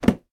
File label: household book put down; sound effects one